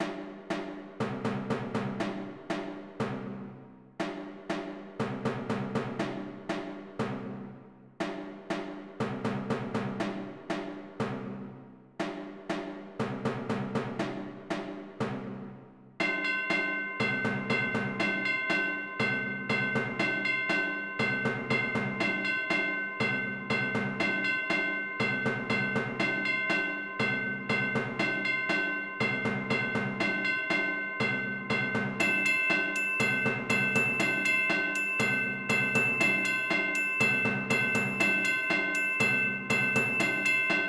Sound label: batucada